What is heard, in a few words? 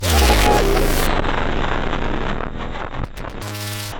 Designed Circuits - Noise burst, short, servo; Gregor Quendel